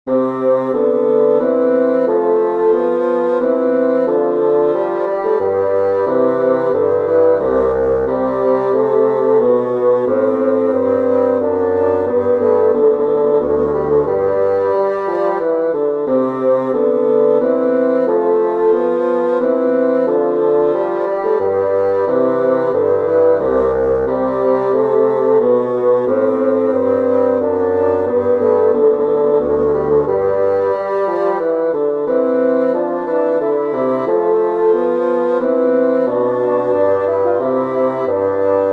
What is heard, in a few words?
chorale for bassoon duet; bach johann sebastian; schaff mit mir gott